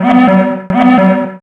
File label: ping